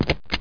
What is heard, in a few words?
plate on; landslor